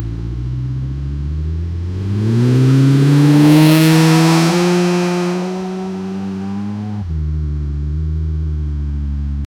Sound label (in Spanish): automovil deportivo corri